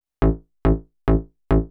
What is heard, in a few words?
TECHNO BASS 140 A